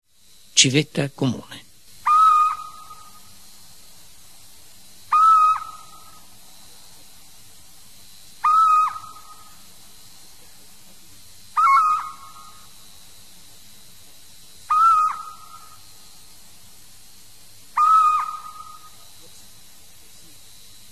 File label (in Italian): Civetta Comune